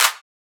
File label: Clap; Aqua; Cymatics; Drum One Shots